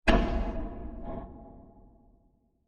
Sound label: Impact Blast With Scratch; Blasts (Sound Effects:Impacts); CraftedSignals